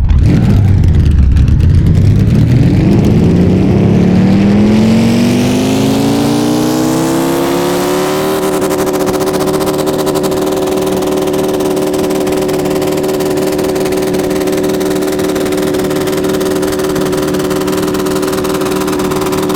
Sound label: rev, vehicles cars; nascar; lwcars